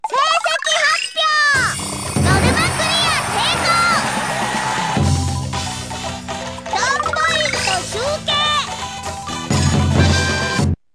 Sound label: applause; o!mania v3 (Bars)